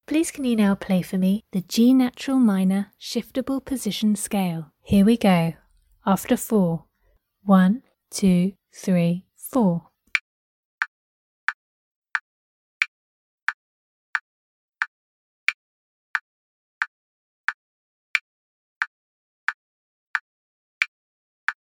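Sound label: g natural minor shift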